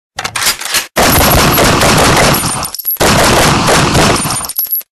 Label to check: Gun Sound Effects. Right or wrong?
Right